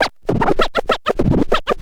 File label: scratch short, Vinyl scratches